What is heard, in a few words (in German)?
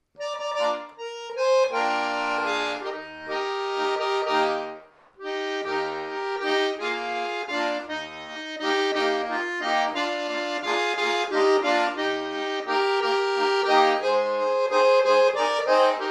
walzer potpourri; oergelinoten